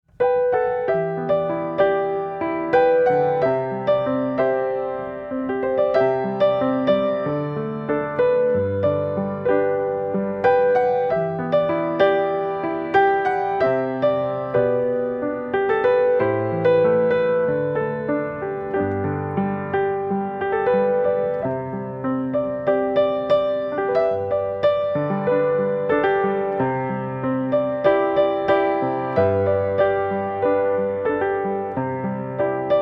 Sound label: piano